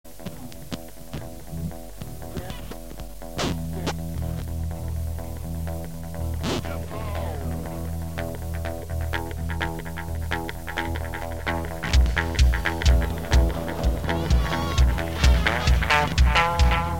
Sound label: instrumental; punk